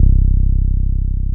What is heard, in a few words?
TB-303